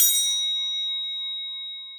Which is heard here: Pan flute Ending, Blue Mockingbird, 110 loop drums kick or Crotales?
Crotales